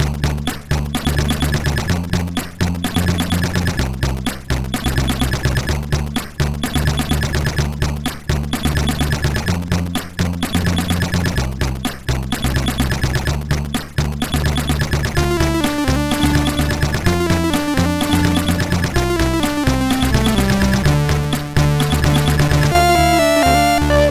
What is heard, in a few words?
MOS6581R4, sid